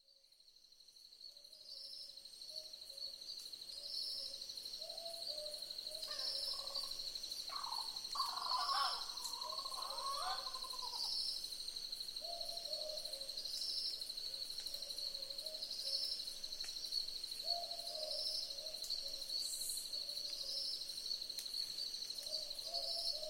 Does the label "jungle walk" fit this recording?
No